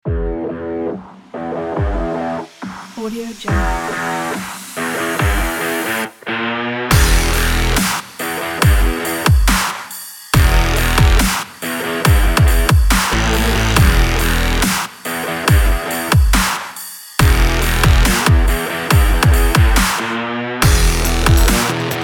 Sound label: electro rock; nikitsan